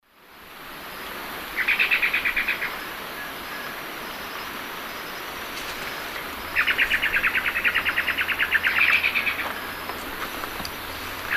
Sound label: Cercomacra serva, alarm call, Eastern Lowlands, S. America